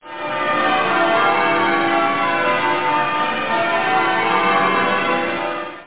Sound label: Church bells